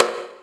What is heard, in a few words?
JV KIT; Pro-Rec - Synthsonic; JV ANALOG2